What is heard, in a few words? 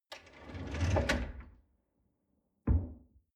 folding door close